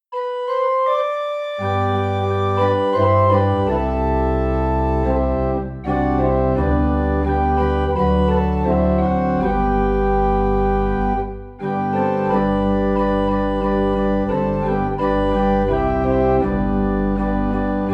Organ in G

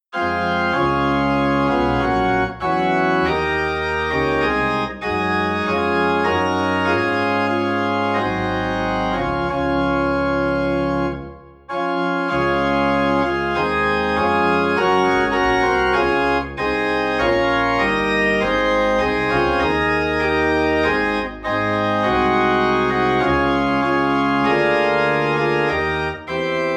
Organ in D Flat; Almighty Father Strong to Save

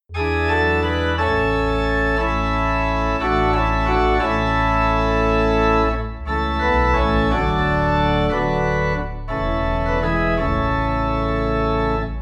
Organ Low in A

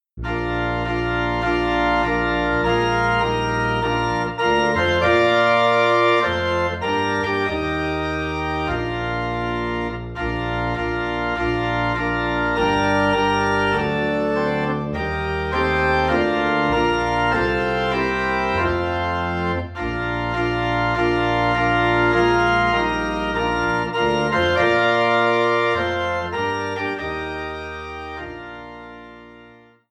Organ in B Flat

